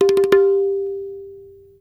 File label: TABLA FILL, Indian perc; Spectrasonics - Heart of Asia CD2